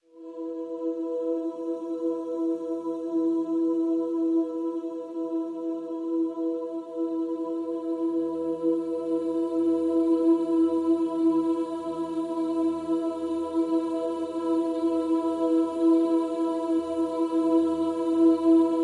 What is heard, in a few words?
Pads Vocalicious (E)